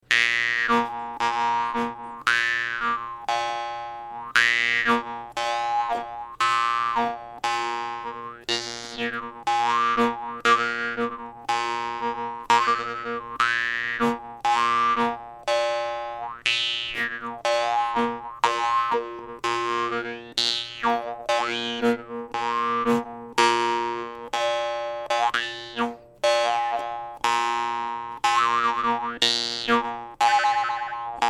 high, vargan; drymba dernovoy

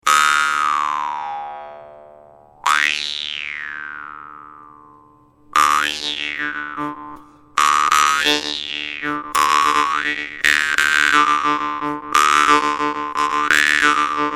sterh, vargan

